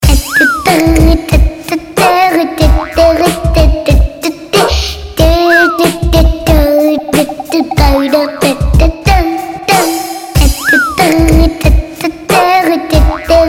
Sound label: Cute Song